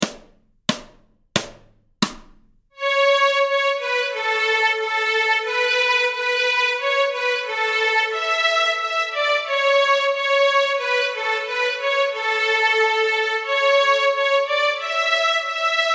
Stråk A, Fiol; Go tell Aunt Rhody; Notträning

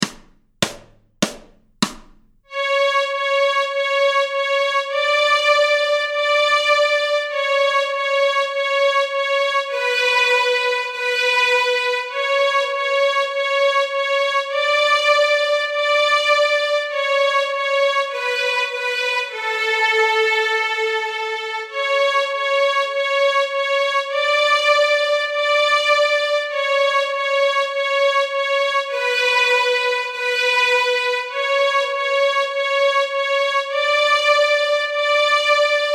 Stråk A, Nyckelharpa; A-strängen Steg 1 Två toner i dur

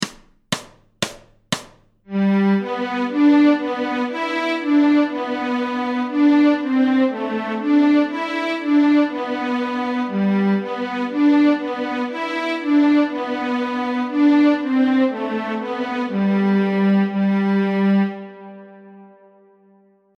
Skojlåt Stråk G, Fiol; Notträning

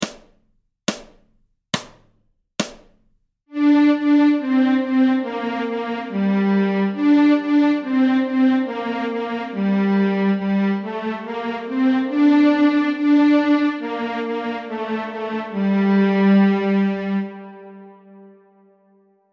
Stave Stenegård Stråk Gmoll, Fiol

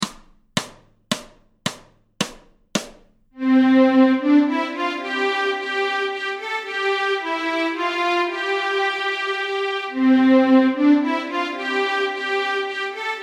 Mora långdans Stråk C, Fiol; Notträning

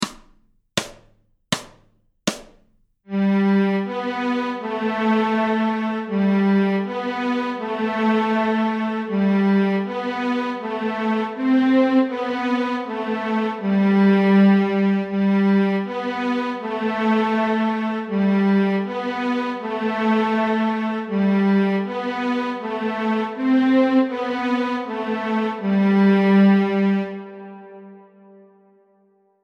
Stråk G, Nyckelharpa; Till Paris; Notträning

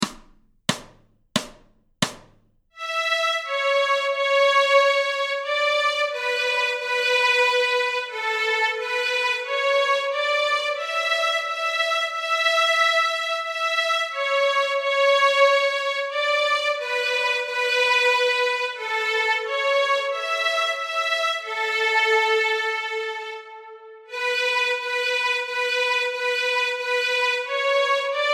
Majvisa Stråk A, Fiol; Notträning

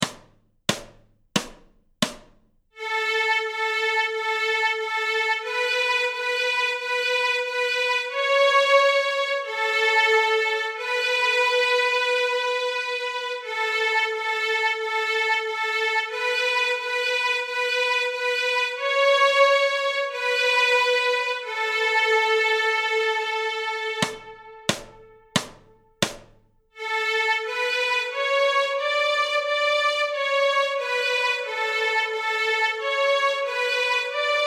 Minilåtar Stråk A, Fiol; Notträning

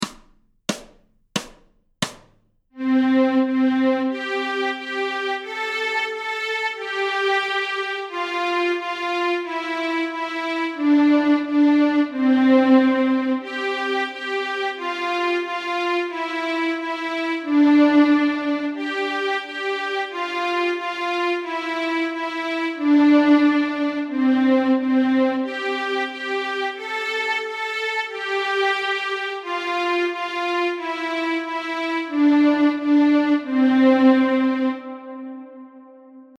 Stråk C, Nyckelharpa; Blinka lilla; A-strängen Steg 1 Två toner i dur

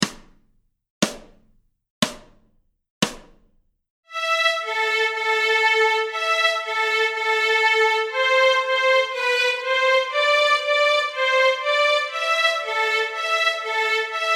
Stråk A moll, Nyckelharpa; Björnlåten; A-strängen Steg 1 Två toner i dur

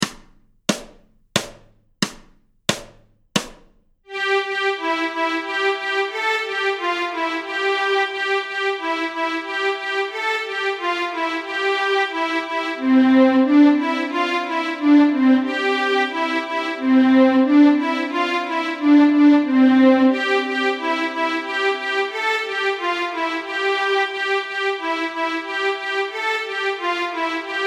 Slängpolska Stråk C, Fiol; Småland; Notträning

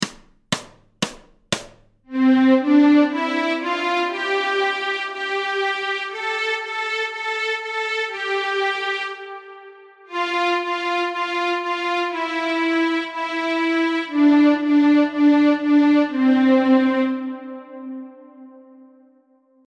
Petronella Stråk C, Fiol

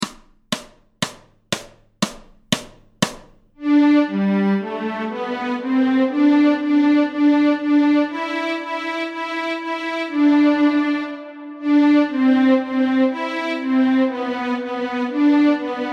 Stråk G, Fiol; Det regnar utan uppehålll; Notträning

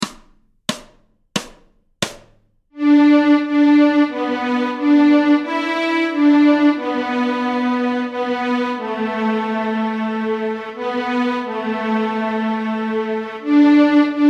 Camptown Ladies Stråk G, Fiol

